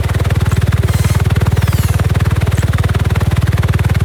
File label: hermit robot idle